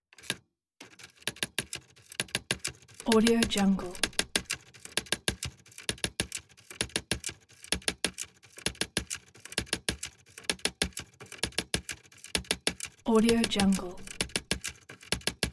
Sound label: hammer mechanic erase; pa4000; soundbits-soundfx